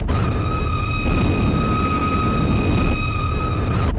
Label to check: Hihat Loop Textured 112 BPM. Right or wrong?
Wrong